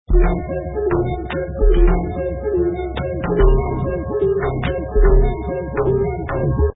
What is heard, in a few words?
beats; adafruit circuitpython bundle; macropad; macropad mp3